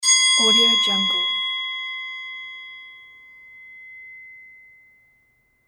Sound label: ding bell